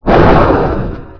blow up

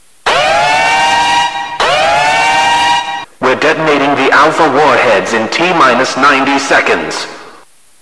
nuke

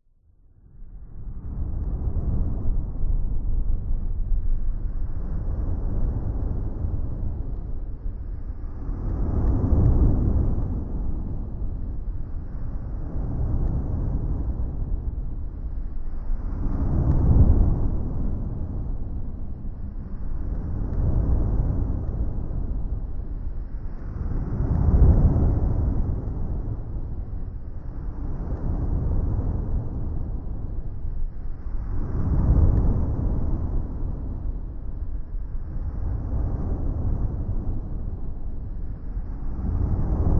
amb special; Resurgence

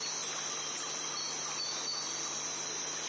forest

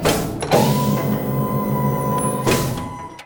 door

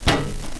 player ladder

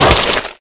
attack hit, pseudodog

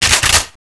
m3 pump, weapons

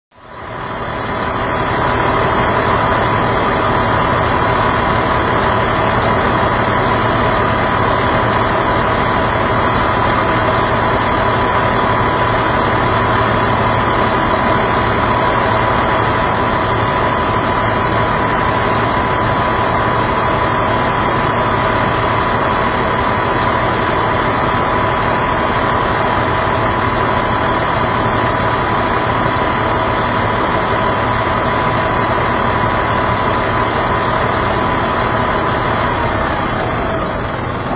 idle truck

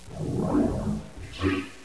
idle, houndeye

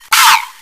die

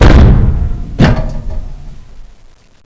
door stop

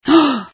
startle, female

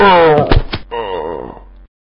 assault die

